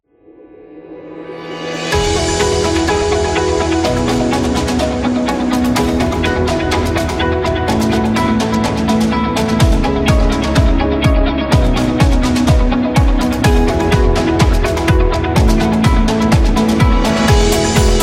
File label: A Corporate